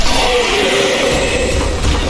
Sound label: death hollow warrior; Dark Souls III